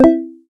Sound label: message